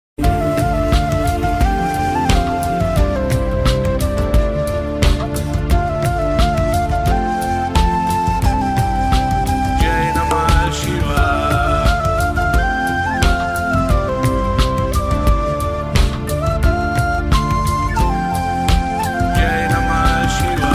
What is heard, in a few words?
om namah shivaya flute music ringtone